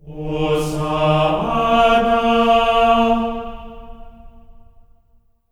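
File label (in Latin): hosanna D#4; Spectrasonics; Symphony of Voices; GR HOSANA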